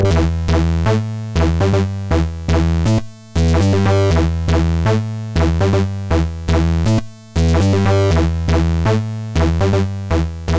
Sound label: Studio Electronics Tonestar 2600 Filter Out to Waveform Out